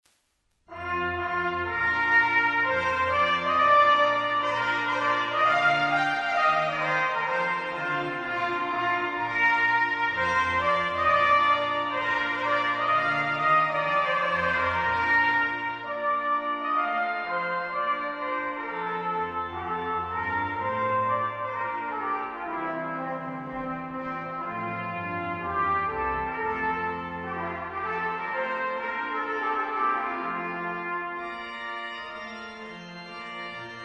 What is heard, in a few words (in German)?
Orgel und Trompete zum Auszug; Hochzeiten